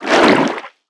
creature snowstalker baby swim

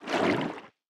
creature seamonkey swim fast

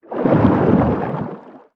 creature chelicerate swim fast

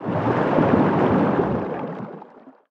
creature arctic ray swim twist

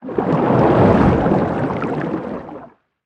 creature swim slow; chelicerate

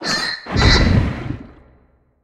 creature attack; brinewing

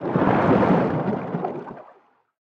creature arctic ray swim fast

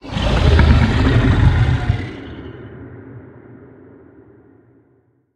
creature callout; bruteshark

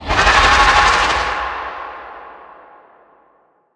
creature ice worm disappear

